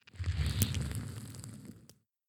ignite fire; adams venture origins; Kismet

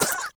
Monster Death; A Robot Named Fight

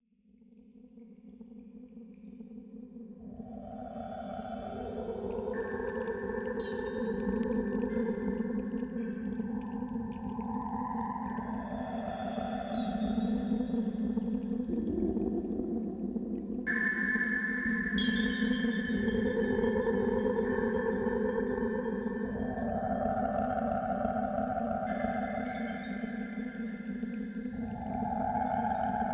Ice World ambience; Lode Runner On-Line The Mad Monks' Revenge

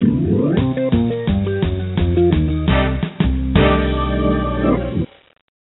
Jingle Caught; Neighbours from Hell; NDS

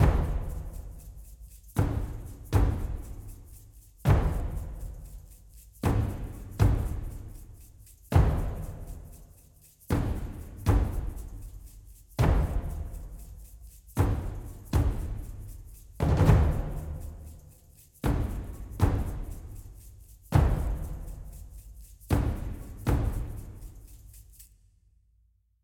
Music Battle

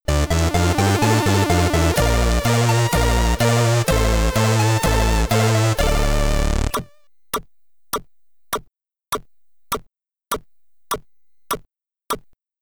Continue, SID Stereo, Commodore 64; Parsec

